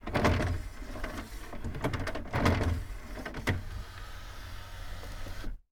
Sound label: entertainment unit - cd tray open; devices accessories utilities; NS Studios - ultra sound collection